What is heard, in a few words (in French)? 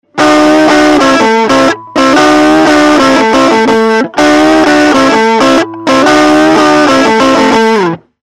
tele manche tubescreamer; fender champion600